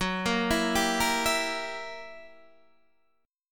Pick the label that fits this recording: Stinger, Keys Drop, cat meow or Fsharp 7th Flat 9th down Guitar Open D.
Fsharp 7th Flat 9th down Guitar Open D